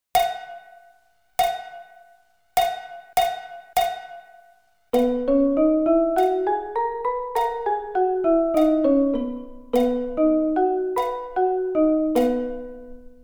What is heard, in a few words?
B Scale